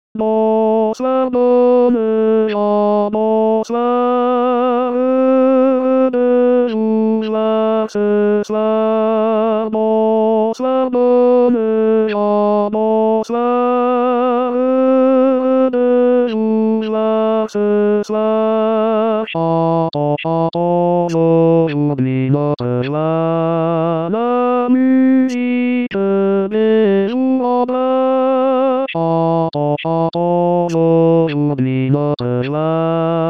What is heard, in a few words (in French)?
Tenor